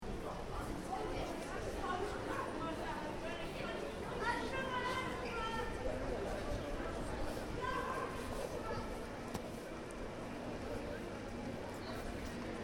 Shopping Mall, Interior: Ambience, Small, Uk; Stores and Malls; Backgrounds; Stores And Mall Sound Effects; Stuart Duffield

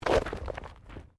Body movement Impact Roll Gravel; Body movement foley to simulate falls and deaths; Rolling; Objects

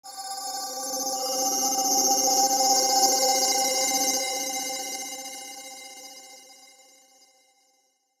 Shimmer Electronic Chopper; Blastwave

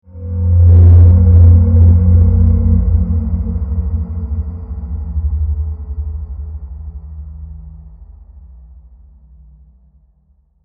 Magnetic, Ray, Beam, Heavy, Science Fiction, 5.1

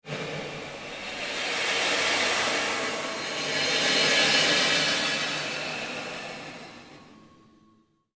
Created Surge Fade

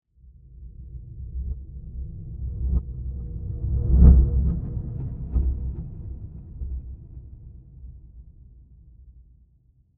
Low Transition With Reversed Delays; Scary Soundscapes

